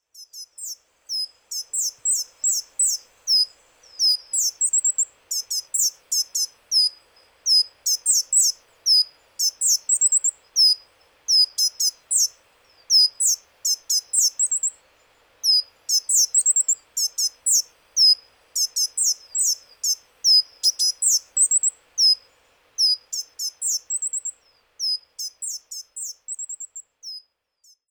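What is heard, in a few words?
Coal Tit; higara; okayama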